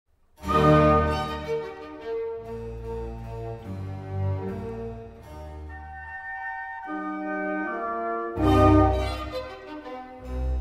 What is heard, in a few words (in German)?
Sinfonie Es Dur Ausschnitt